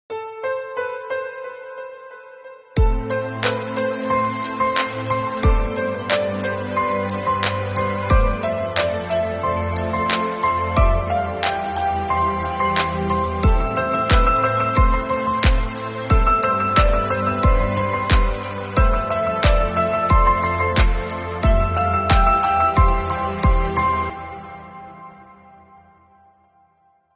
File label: Mobile Ringtone